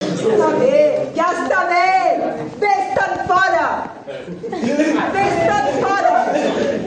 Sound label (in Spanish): grito de ayuda